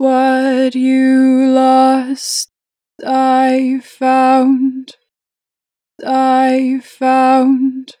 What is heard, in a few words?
Natural Vibrato, Vocals, 4 bar vib; Lost; Harmor Vocals